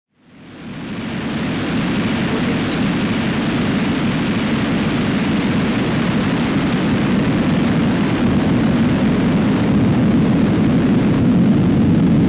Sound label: rocket